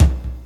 vinyl pop steel kick drum sound b key, kick drum single shot